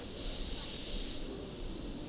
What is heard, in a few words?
Spring L, train; nankai6000; OpenBVE